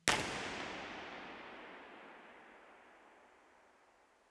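warehouse stereo, impulse response, factory warehouse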